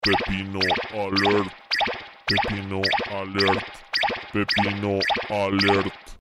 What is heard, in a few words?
alert; pepino